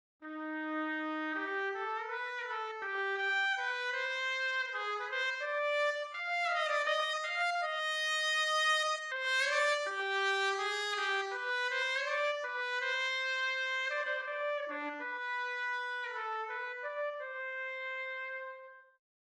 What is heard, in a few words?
Trumpet